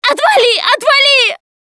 scream while pounced, russian, Survivor Voice Producer